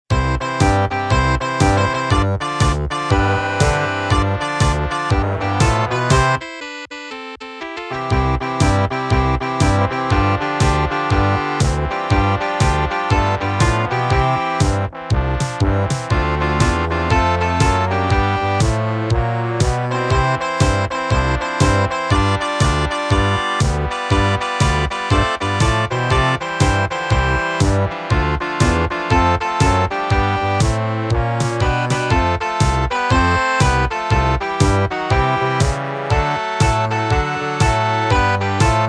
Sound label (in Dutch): Wij zijn tractormonteur blaaskapel; Muziek Makerij Heeze